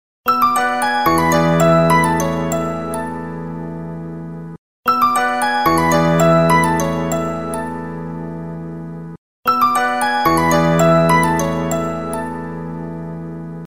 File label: nokia classic ringtone